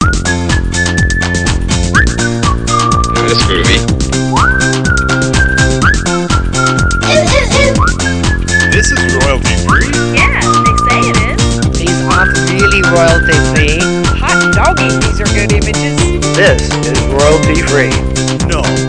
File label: new groove